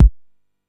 hot kick drum A key, kick drum sample tuned to the A note, Loudest frequency: 121Hz